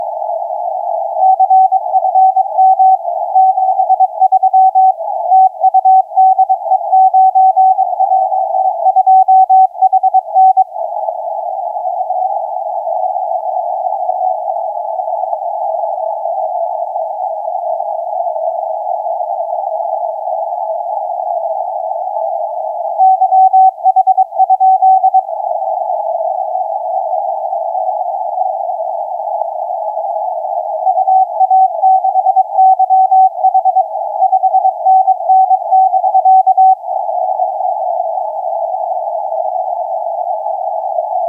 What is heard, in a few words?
20m CW